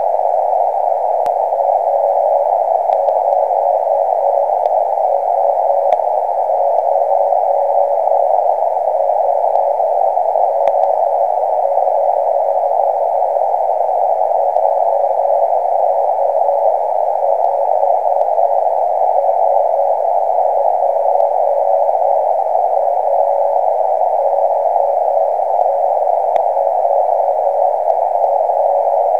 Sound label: drake cw